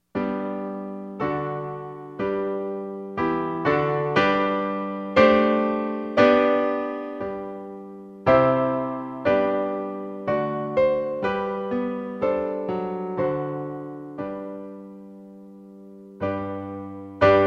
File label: chorale; malan cesar; hymnes et cantiques n 030